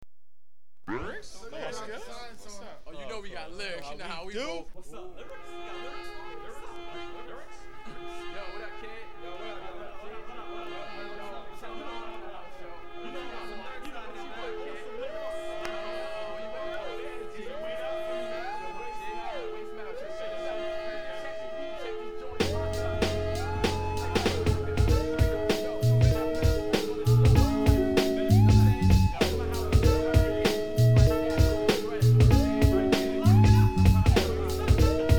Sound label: hiphop